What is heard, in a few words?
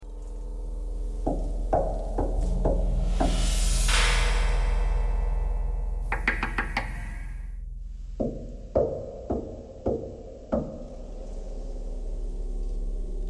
knocking thread noise